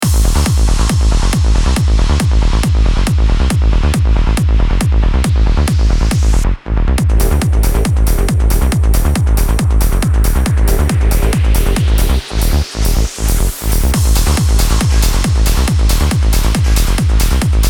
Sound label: Tech Trance Percussion SAMPLES; Tutorial